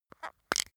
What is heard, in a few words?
makeup box open, badezimmer; haus-garten